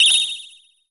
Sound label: Templar Hand of Light; Inotia 3